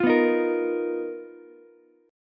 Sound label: B Major 7th Flat 5th B down Ukulele